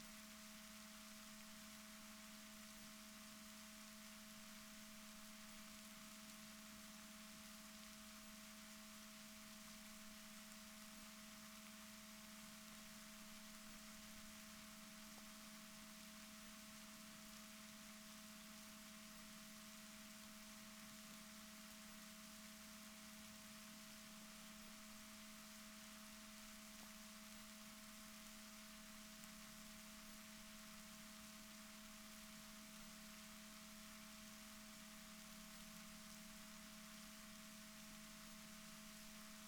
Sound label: rorquals negatif, Balaenoptera Physalus Chile